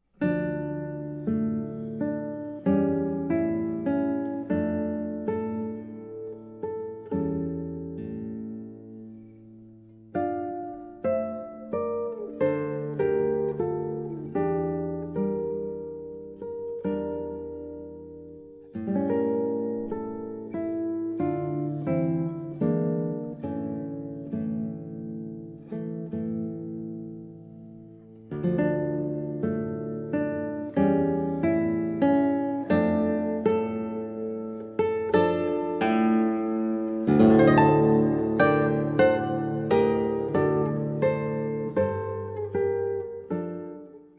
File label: Valses Poeticos, Tempo de Vals Lento; 8 Valses Poeticos; No. 3; Arr. Raphaël Feuillâtre